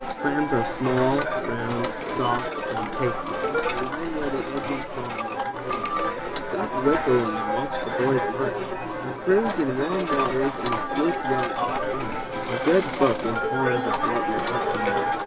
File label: casino